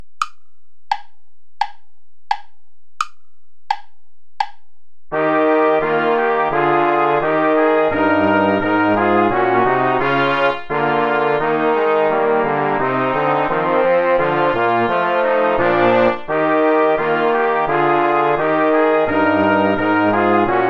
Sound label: play along without trumpet